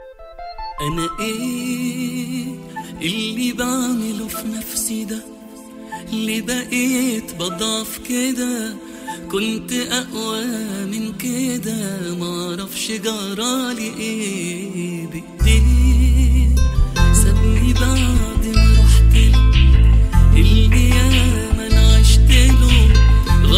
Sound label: pop arabic world ringtone